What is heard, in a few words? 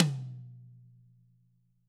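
tom 10 hit; ILIO; Double Platinum Drums 2; TAMBKIT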